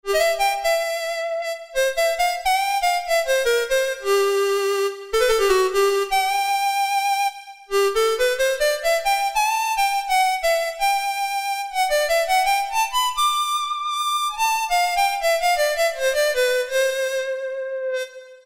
Solo; Cheetah MS6

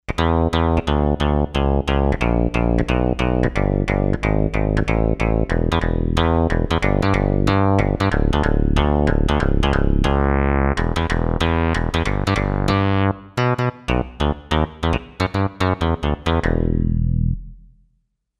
Tube Bass, Kawai K5000S

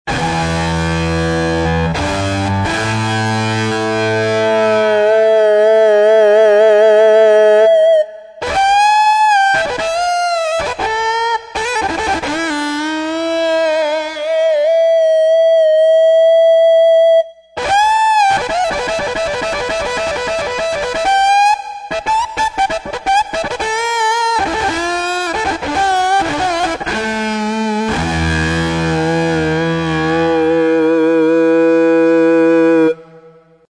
Dist Guitar, Yamaha TG55; Factory Presets

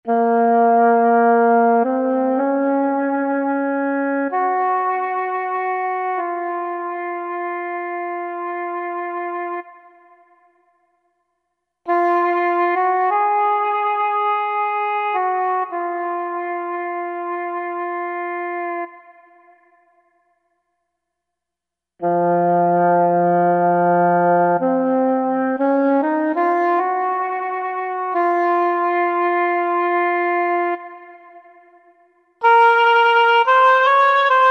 Holy Oboe, Roland V-Synth; Factory Patches

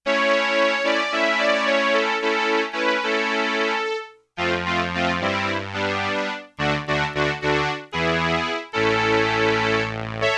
BRASS with Ensemble II, Roland RS-202

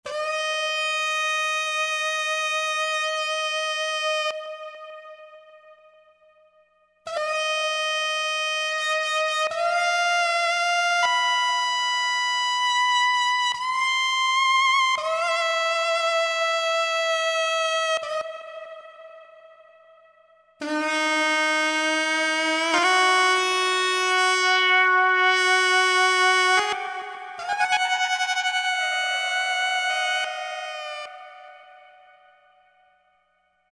Asian Lead, Roland V-Synth; Factory Patches

